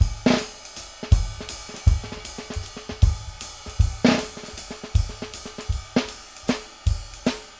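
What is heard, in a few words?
ride ghosts, drum; Sampleheads; New York City Drumworks VOL-1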